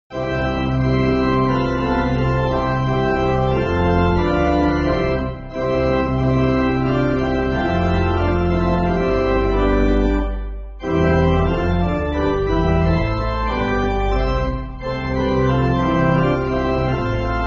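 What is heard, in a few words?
Pipe, Pipe Organ, Music Backing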